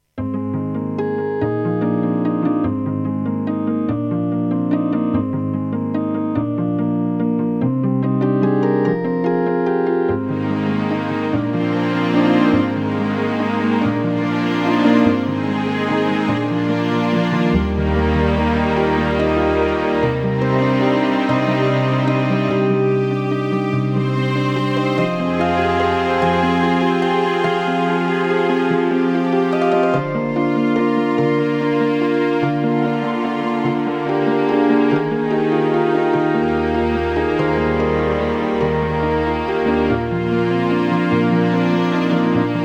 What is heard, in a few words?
Irish, EMU Sound Engine; GM MIDI